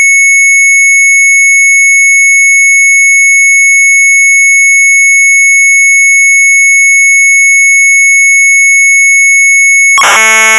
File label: MZ800 Tape, sharp; INGESCHREVEN; retromelsarchive